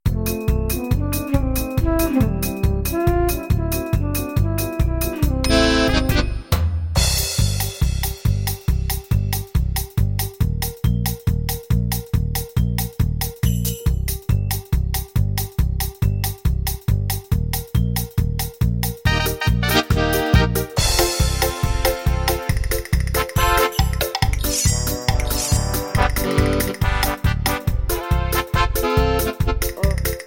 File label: 50s French, rhythms; mzx500; preview pack 01